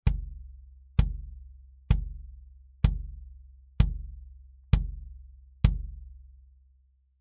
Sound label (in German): Beta91 innen, bd mics